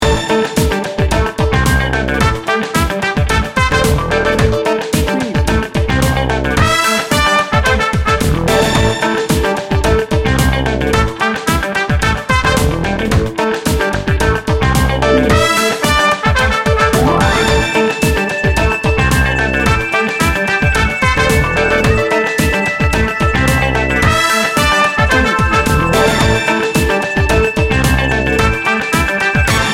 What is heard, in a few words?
funky street